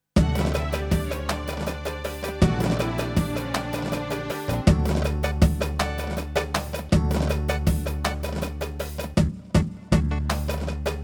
Alliji

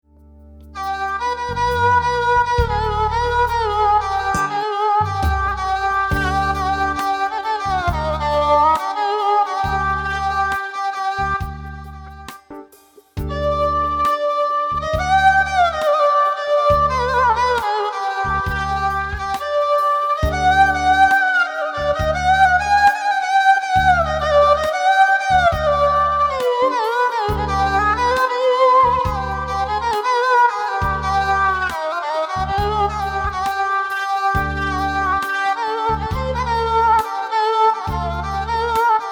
Violin Bayat D; Pa2X

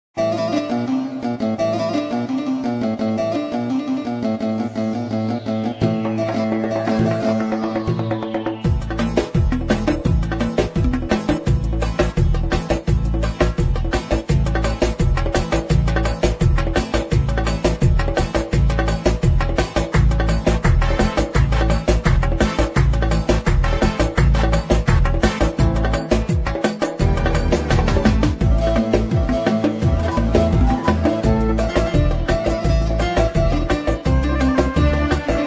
misket, turkish

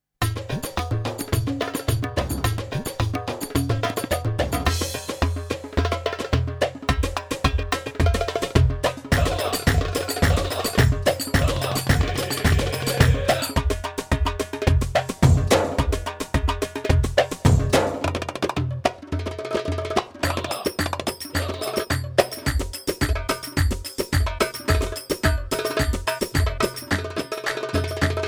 Solo Tabla; Demo Styles

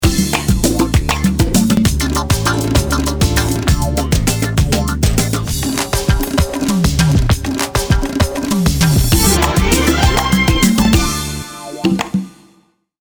IRANI, Styles

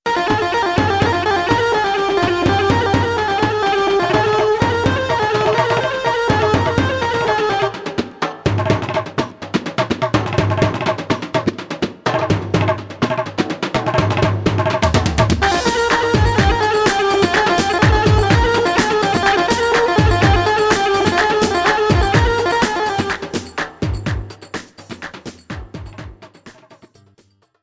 nawari; pa80; exb pa1x

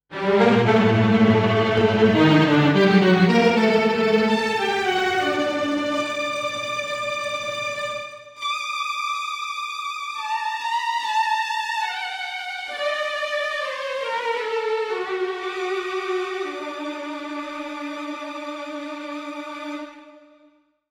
Tremolo; Pa2x

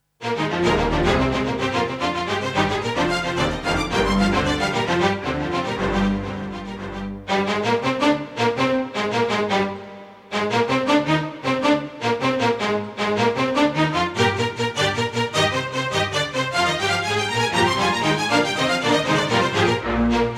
Staccato; Demo Instrumente

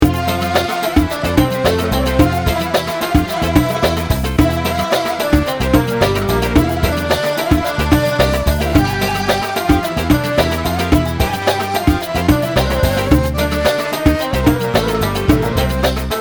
Jerk

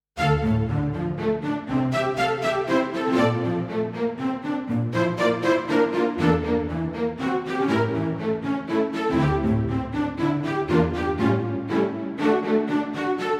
Spiccato; Pa2x

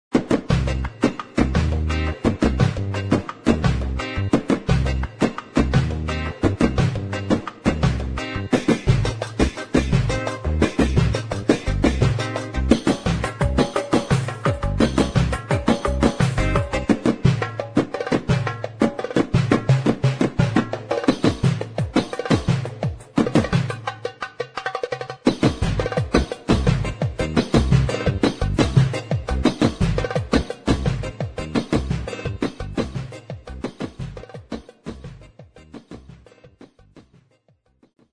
magribi, arabic; pa80; korg

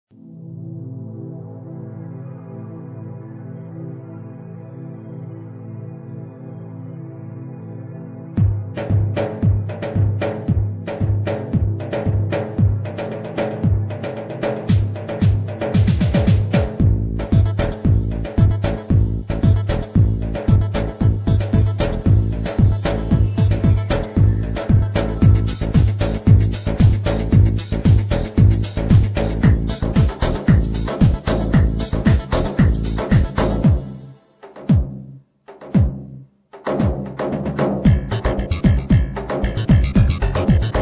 halay, turkish; pa80; korg

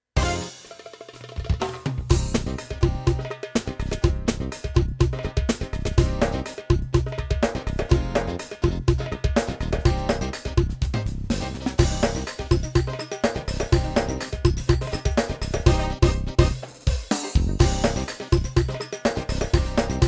Saidi Modern

